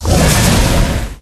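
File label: Dragon Skeleton buff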